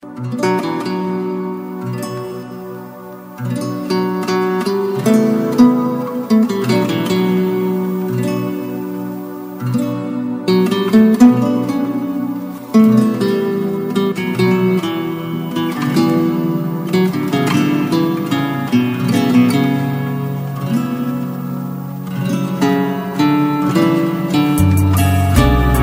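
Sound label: Romantic Guitar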